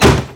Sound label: wood crate impact hard; physics